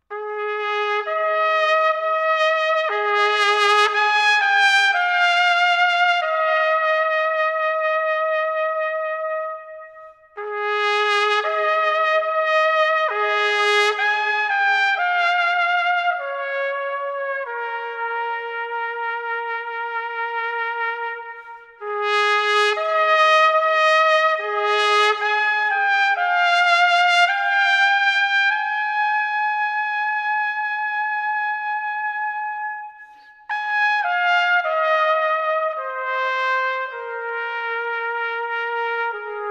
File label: Trumpet Solo; Trumpet Intro